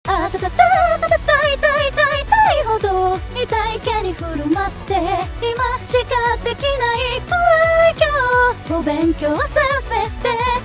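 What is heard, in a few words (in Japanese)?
Synthesizer V, サビ, ダブリング適用後, 歌声; Synthesizer V Studio 2 Pro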